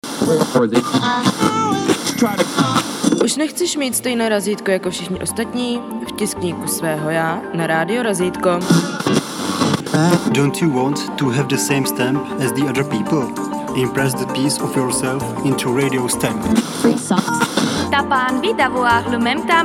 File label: Jingle; Europa